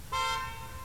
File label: Honk